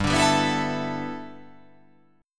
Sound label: G 6th Add 9th G, chord